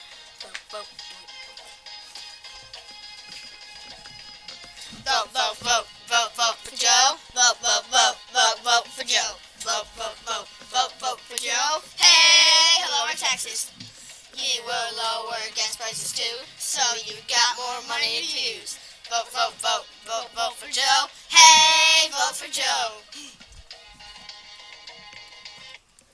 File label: vote for joe jingle